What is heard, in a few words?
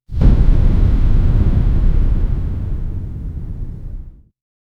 rumble of airlock from sp